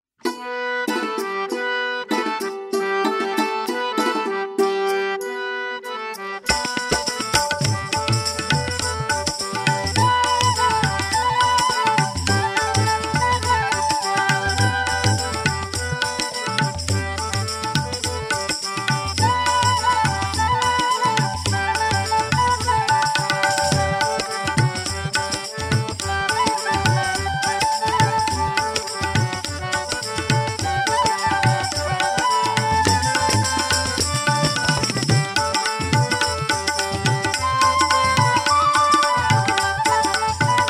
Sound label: Gents Karaoke G Pancham